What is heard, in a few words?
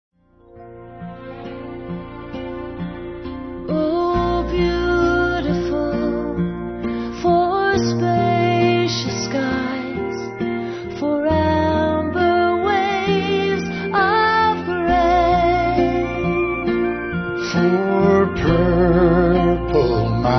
hymn